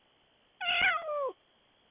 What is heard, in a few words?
cat meow